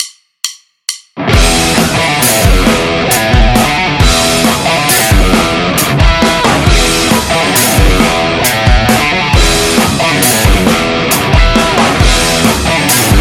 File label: Peavey